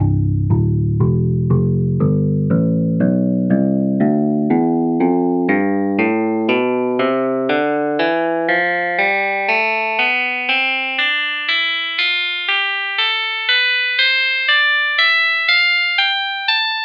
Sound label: clean guitar tonleiter